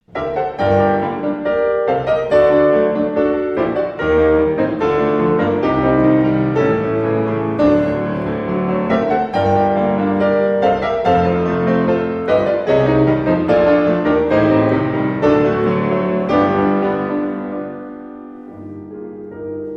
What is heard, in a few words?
Piano Pieces, Ballade, Allegro energic; 6 Piano Pieces, Op. 118; Brahms